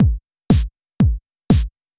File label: Kick Snare